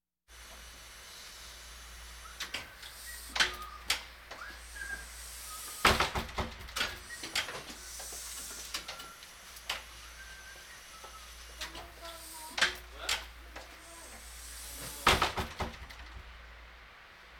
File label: Hospital effects